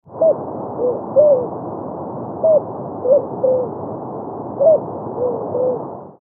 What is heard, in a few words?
island collared dove